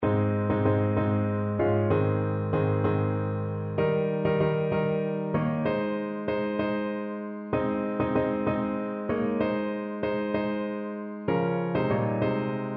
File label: piano